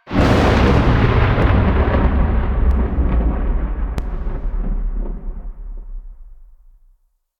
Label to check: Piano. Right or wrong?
Wrong